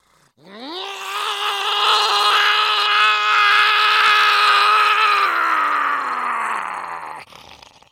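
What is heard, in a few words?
Monster Creature Growl, foley monster vocals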